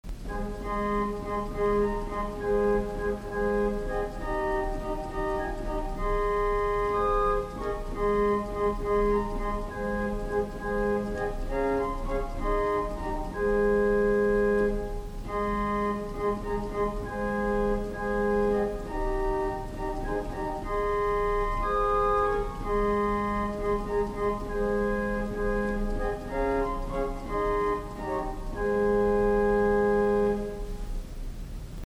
Organ Choir Nachthorn 4

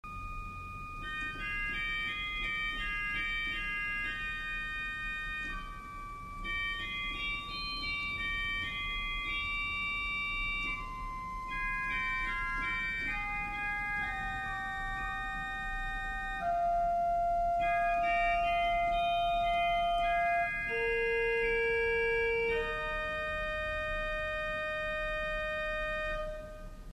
Organ Choir Larigot 1 1/3

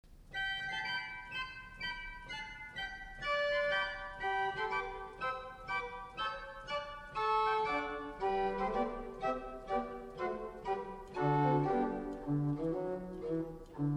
Organ Choir Unda Maris

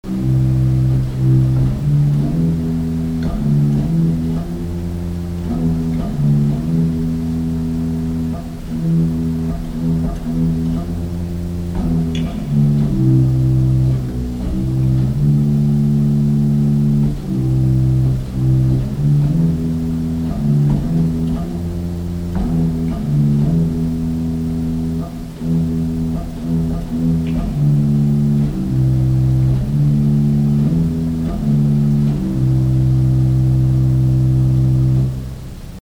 Organ Pedal Dulciana 16

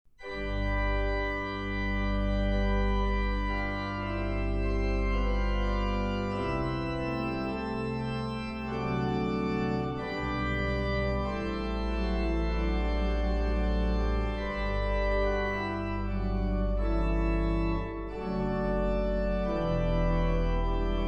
Krebs Prelude and Fugue in G; Bwv 557

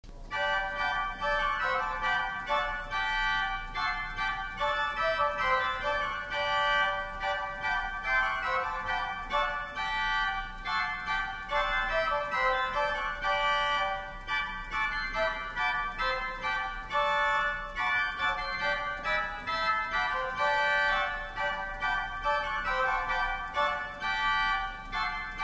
Organ Great Grave Mixture II

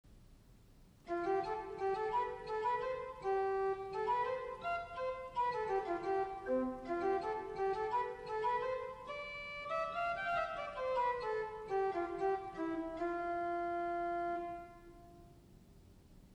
Tune Lydian

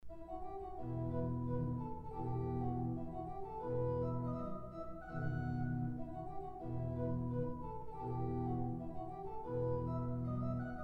organ; Rameau; Tambourin

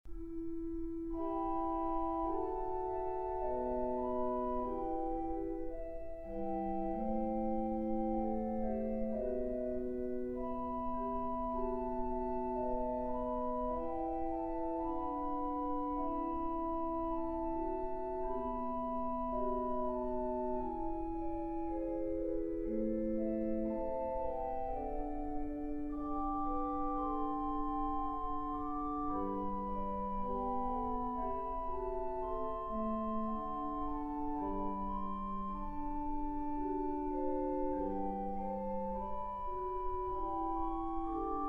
Krebs Prelude and Fugue in e minor; Bwv 555

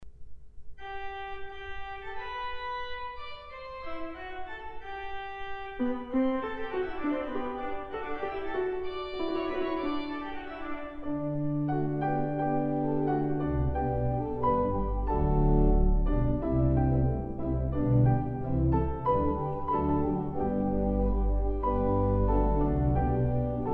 improv Hymn duet; And Can it Be

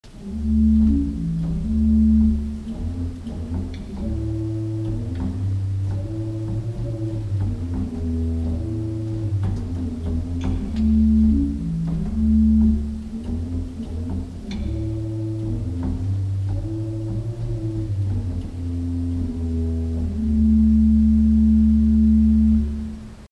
Organ Pedal Bourdon 16

